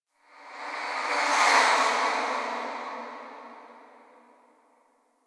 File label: Spirit Chatter, Ambience